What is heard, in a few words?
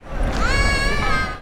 Child Shout In The Middle Of The Street